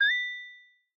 Confirm; leohpaz; retro rpg menu sounds

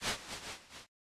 dustbin, interface sounds; p0ss

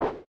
drop, interface sounds; p0ss

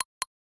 click, interface sounds; p0ss

